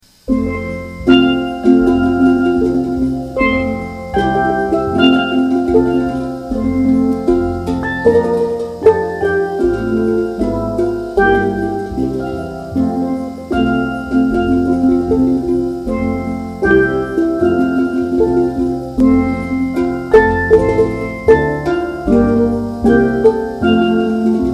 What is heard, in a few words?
bridal march traditional, steeldrumband